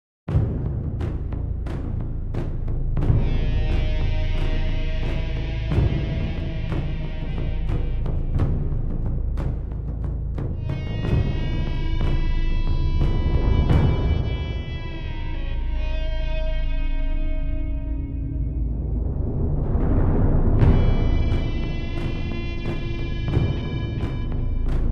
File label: dungeon fight day